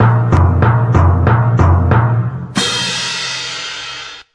presenting drumroll

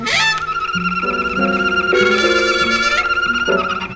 crazy trumpet

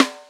Snares, Rimshot

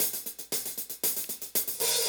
soft click, Drum loops

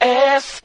ass SUNG

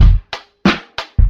Drum loops Boombap

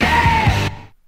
"hey" SUNG; Voice; ooh ahh

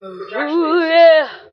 "hoo yeah", voice

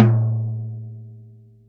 Toms

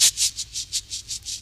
shakes, Drum loops

